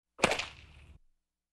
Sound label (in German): sport - peitschenknall